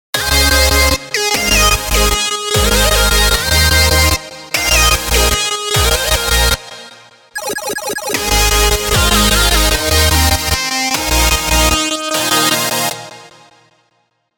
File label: on sidechain for snare